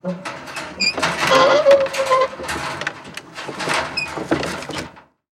Industry Gate Metal Gate Rattle Creak And Squeak

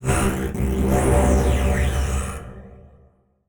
Transition Laser Distorted; Production Element

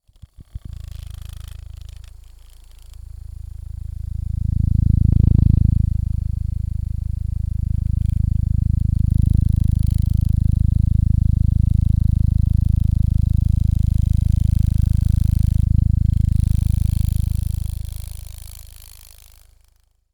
Industry Tool Motor Small Motor Run Vibrate

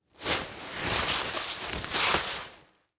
Coat Rustle Leather Short; Foley

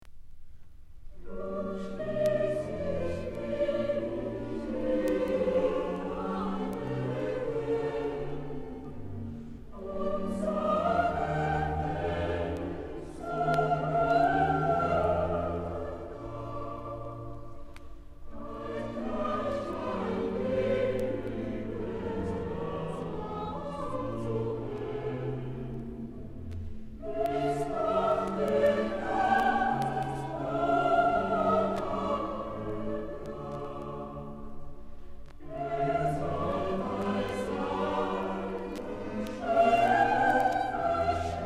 Bach Motet; BWV 229 II; Bachchor Stockholm; Bach 6 Motets

